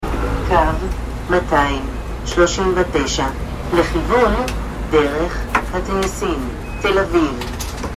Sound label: bus; tlv